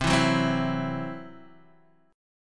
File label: C-Major Flat 5th, Guitar Open D